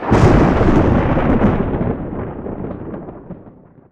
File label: Thunder Strike; 1944 Battle Of The Bulge